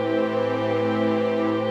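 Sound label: Pad A minor 7, Orchestral; Sonic Foundry (Sony Creative Software); Orchestral VOL-2; Pads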